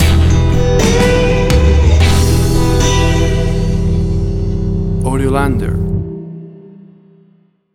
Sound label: Blues From Yesteryear Stinger